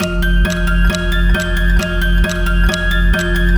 Ill Omened Clock Urgent, horror music box; HauntedBloodlines

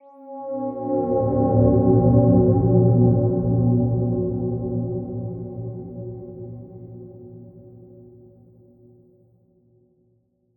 Space Ghost Moan; Haunted Bloodlines; SFX Producer; Eating Cinematic

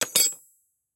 Pipe Drop Rocks; MinorHallutinationsSounds1

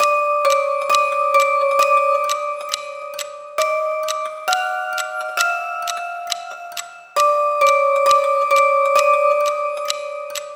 HORROR MUSIC BOX, Loop; HauntedBloodlines

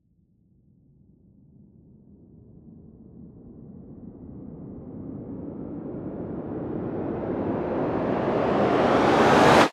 Horror Crescendo

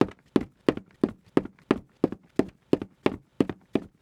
Footsteps Wood; HauntedBloodlines; SFX Producer

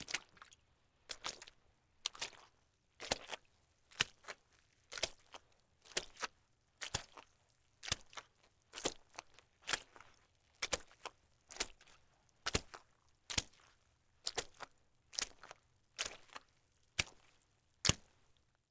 Mud Slow Long; Footsteps; HauntedBloodlines; SFX Producer

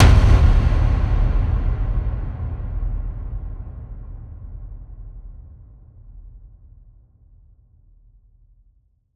Hit; HauntedBloodlines; SFX Producer

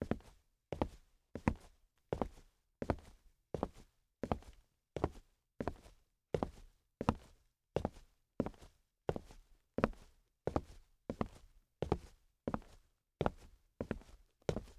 Female Boots Walk; HauntedBloodlines; LaundryCinematic; SFX Producer

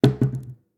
Cupboard Door Close; SFX Producer

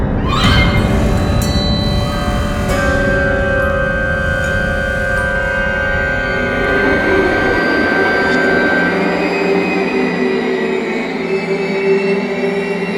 Blood Carousel Looped, music; HauntedBloodlines

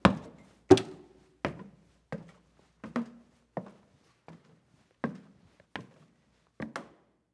Stairs Up Wood; Footsteps; HauntedBloodlines; SFX Producer

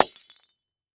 Crash Glass Bulb; Lights; Break; HauntedBloodlines; SFX Producer

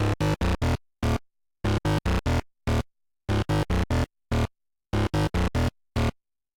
8bit ambience; Loading Screen

